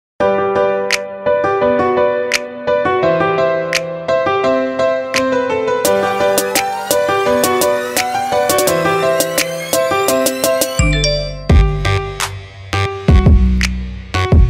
Trap Remix; The Office

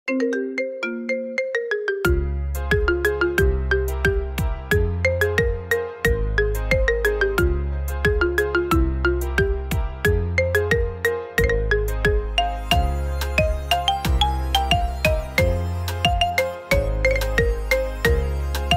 Marimba Remix

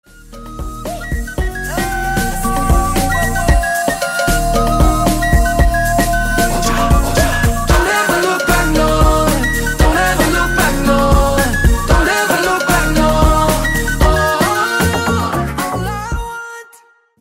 Ojapiano

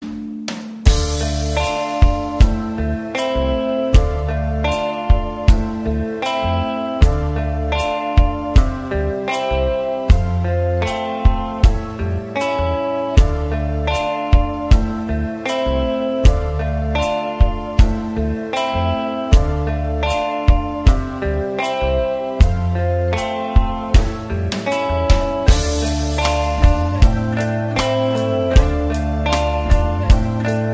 Melodious Instrumental

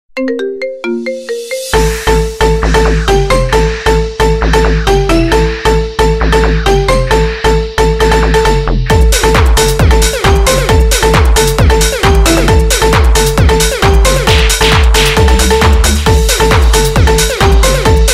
Theme Marimba; Mortal Kombat

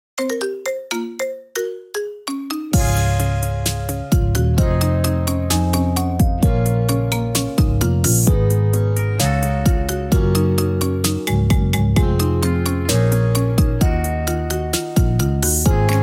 Marimba Remix Ringtone

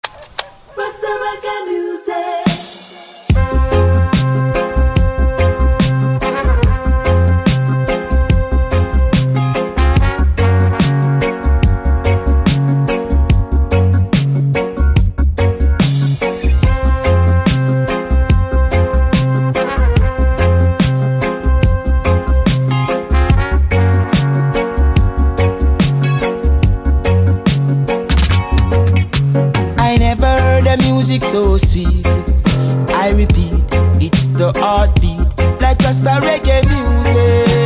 Rasta Reggae Music

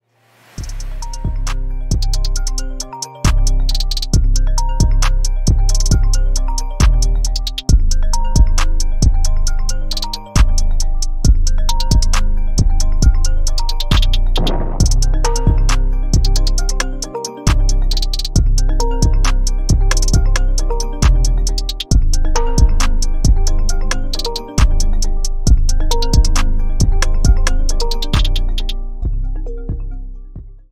iPhone X Reflections Trap Remix

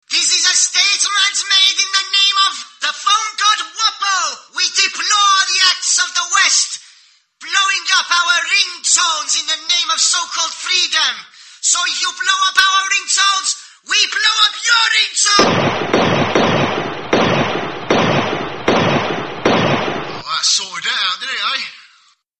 Ringtone

